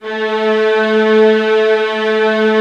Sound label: violins B, Synclavier Strings; ILIO